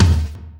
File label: tompad, drums; virtual drum sounds; custom drums